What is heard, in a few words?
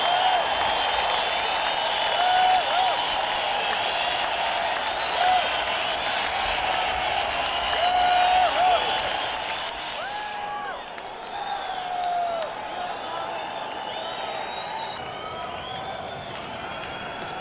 Encore Break